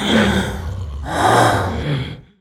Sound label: idle, male zombie; nmrih; zerosiki; slender